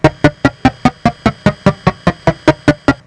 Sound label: dominos falling